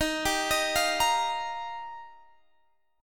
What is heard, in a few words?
Eb Major Add 9th, Guitar Standard; Ebadd9 arpeggiated; x,18,17,20,18,18; JGuitar